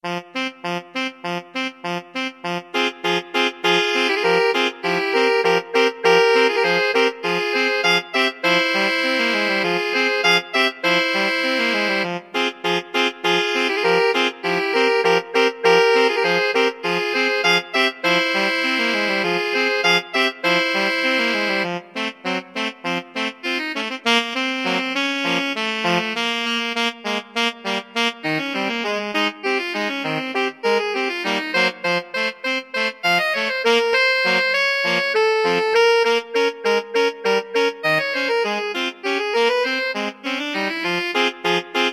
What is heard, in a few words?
alto sax trio